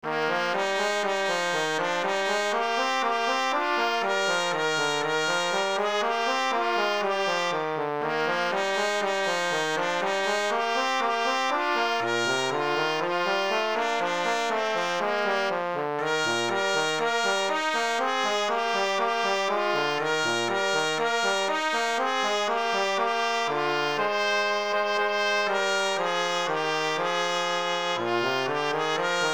Hark! The Herald Angels Sing for Trumpet and Trombone